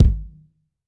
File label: dope kickdrum sample c key, kick drum single shot tuned to the C note